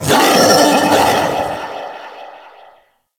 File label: Kubrow Feral Vocal Call; Enemies; Warframe